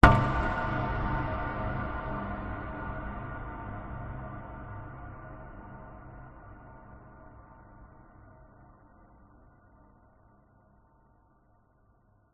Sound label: sound design cinematic hit dark reverberant shock terror suspense